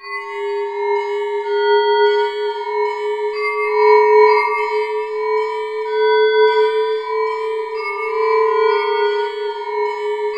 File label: AQUAPHONE, MUTANT BELLS; Zero-G Ambient 1